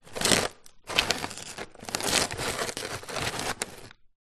household freezer items slide movement inside

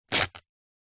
fabric cotton rip; foley; zapsplat

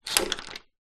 household door chain slide lock or unlock

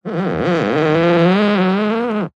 office chair leather executive rub squeak creak

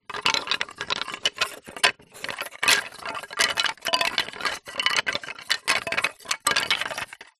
wood pieces thin small light movement; foley; zapsplat

